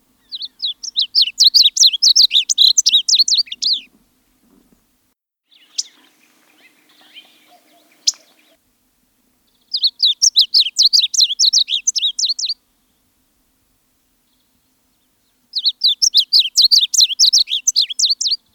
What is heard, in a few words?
Varied Bunting